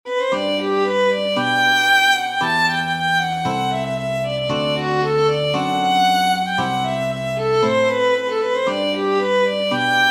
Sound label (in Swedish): Polkett; Spel-Pelle Per Knutsson